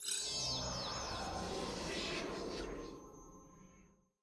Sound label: Bubble; Boba